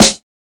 2000s present snare sound g sharp key, acoustic snare tuned to the G# note